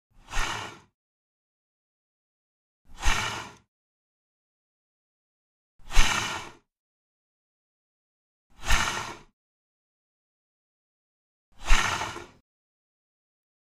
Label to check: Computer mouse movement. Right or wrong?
Right